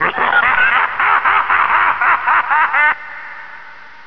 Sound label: laugh